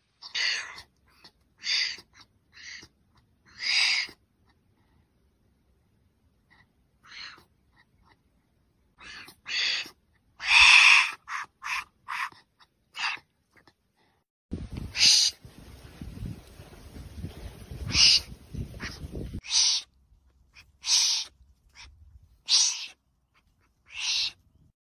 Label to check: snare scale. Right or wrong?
Wrong